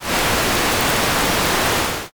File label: fire